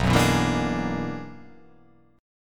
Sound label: B Minor Major 13th, chord; BmM13; strummed; 7,9,9,9,10,9,10,x; JGuitar